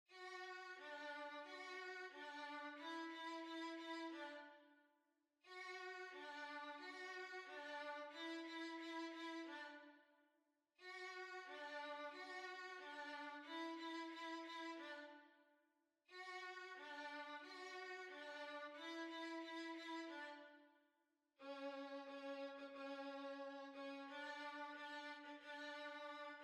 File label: Violin